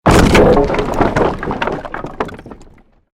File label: created wooden structure crash medium